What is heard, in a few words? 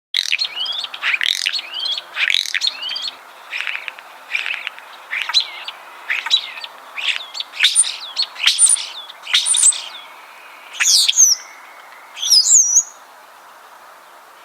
chants et cris de letourneau sansonnet songs calls common starling; hd